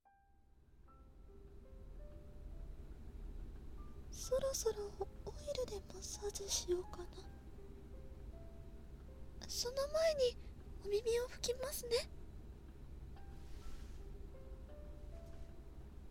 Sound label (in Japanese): お隣さん冬 両耳オイルマッサージ, 曲付き, ASMR